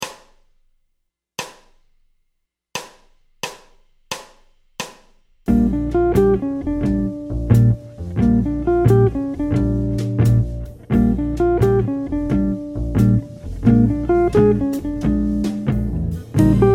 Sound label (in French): Blues rythmique, guitare jazz